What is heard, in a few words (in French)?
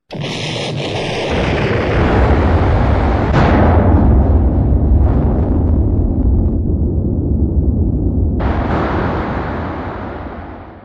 bombe